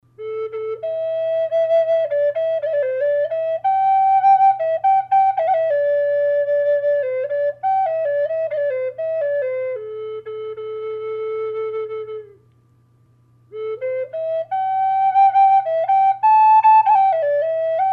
flute; gray wolf; collector flutes

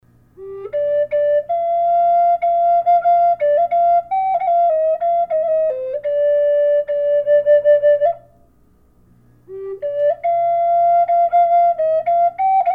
red horse g, flutes; collector flutes

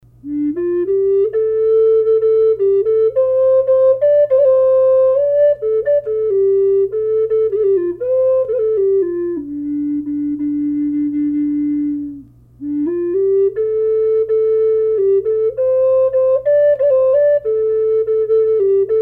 low d, flute; redtailed hawk; collector flutes

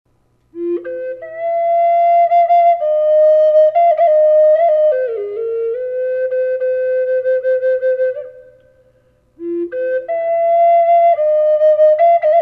Kodiak Bear Flute reverb; collector flutes

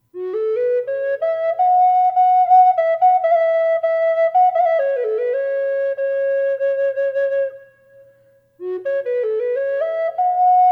bocote grizzly reverb, flutes

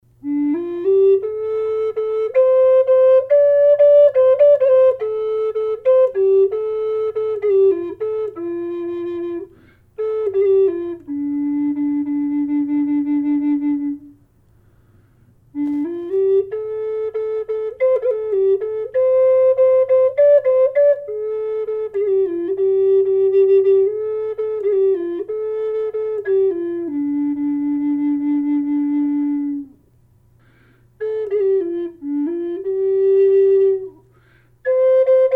humpback whale low d flute; collector flutes

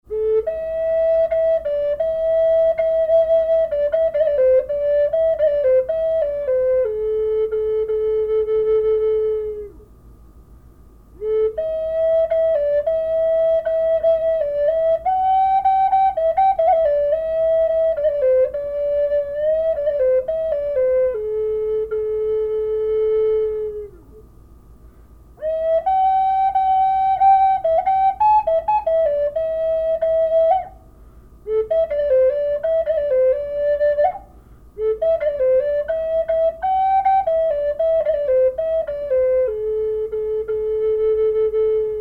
german shepherd a, flutes; collector flutes

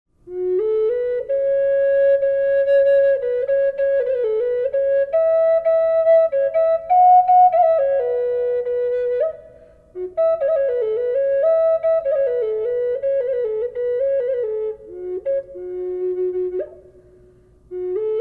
yallow cedar reverb dolphin, flutes; collector flutes

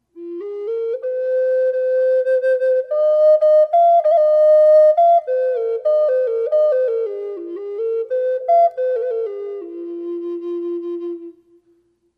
owl e flute reverb

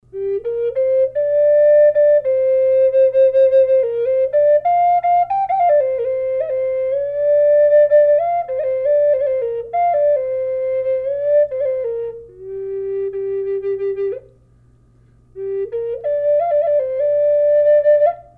bobcat g flute; collector flutes

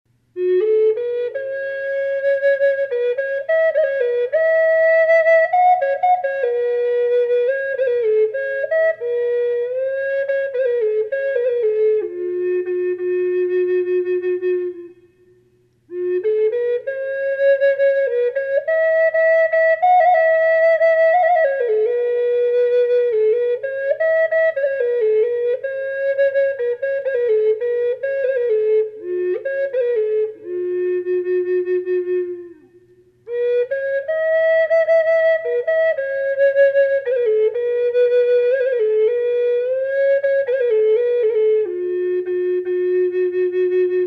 reverb flute; redwood burl; collector flutes

